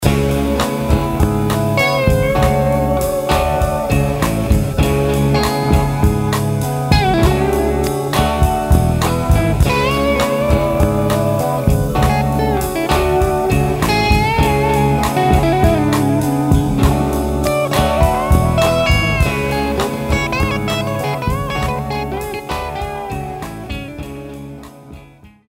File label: C sharp Phrygian Derivative; Parallel Modes; When the chord progression is based around the 3rd chord of a major scale it is called the Phrygian minor mode; This is the derivative way